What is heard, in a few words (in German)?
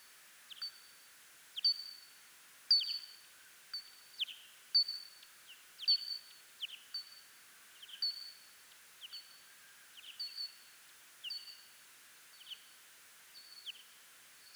einflug morgens zwergfledermaus mit mausohr